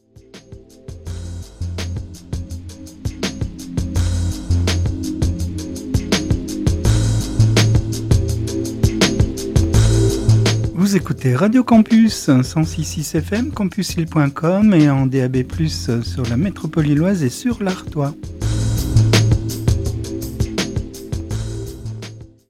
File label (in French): Jingle Radio; Campus 2023